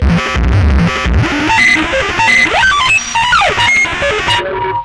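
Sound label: Sequencer, Hard & Loud Techno; USB Soundscan vol.01; AKAI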